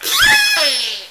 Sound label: call, Orca; SRKW; Alwin; S36